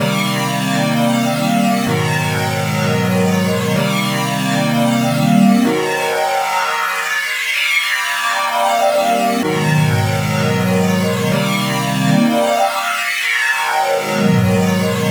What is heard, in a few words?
Pad 127 BPM C, Club Techno; Zero-G; Groove Loops